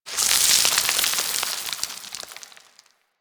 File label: Rock Crumble; Ambience; One Offs; Warframe; Quests; The New War; Drifter Camp